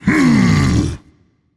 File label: tongue rage; chaac